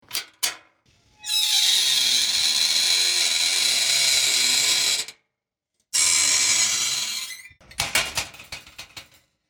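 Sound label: kalitka skripo, creaking gate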